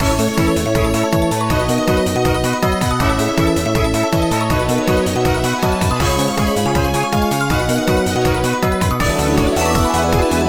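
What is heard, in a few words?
background music bonus; ovenbreak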